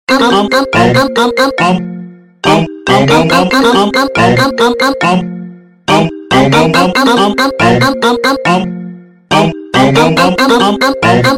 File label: iphone ringtone mashup